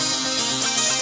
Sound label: 117 hypno synth 2 beats; SYNTH AND ELECTRONIC LOOPS